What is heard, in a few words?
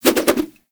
Nunchaku Spin Whoosh; Warframe; Tenno